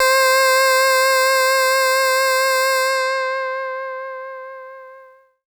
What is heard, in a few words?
SYNTH Simple Thin Square